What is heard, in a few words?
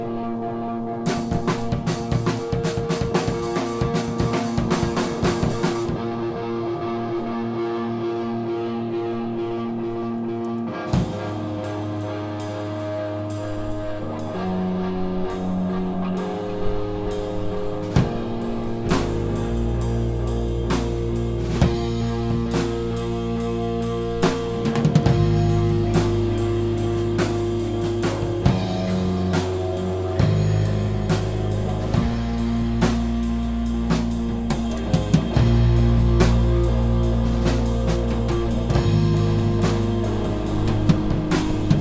Epic reverberation, noise